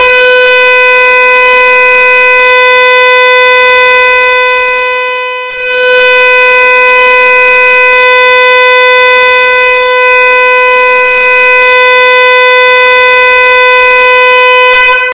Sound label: loud train horn